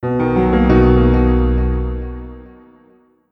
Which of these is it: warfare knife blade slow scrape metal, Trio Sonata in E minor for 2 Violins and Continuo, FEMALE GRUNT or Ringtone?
Ringtone